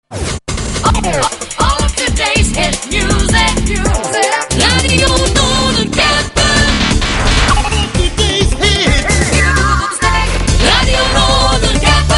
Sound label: Jingles